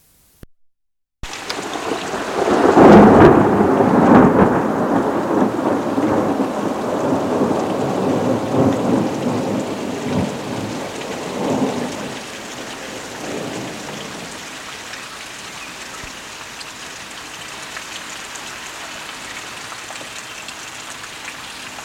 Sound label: thunder